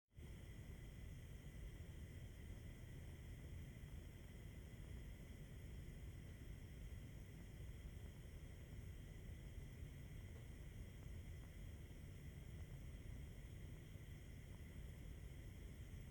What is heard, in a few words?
Household Stove Gas